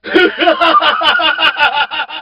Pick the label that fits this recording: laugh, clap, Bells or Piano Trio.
laugh